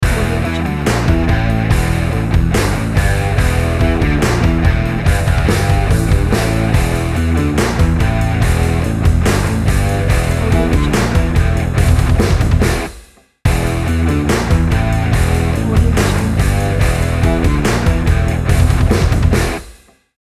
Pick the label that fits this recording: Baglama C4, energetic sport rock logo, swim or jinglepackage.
energetic sport rock logo